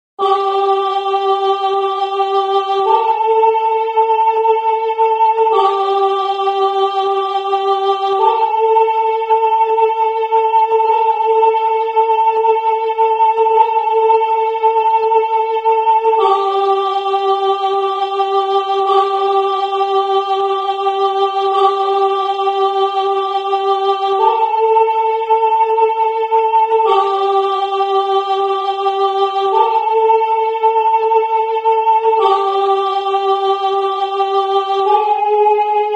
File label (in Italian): soprano